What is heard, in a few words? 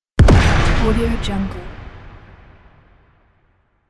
ak47 shooting